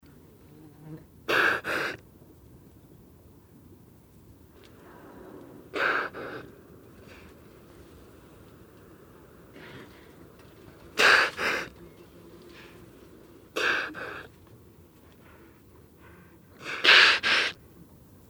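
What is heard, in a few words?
porpoise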